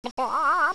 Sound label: item chicken; jailbreak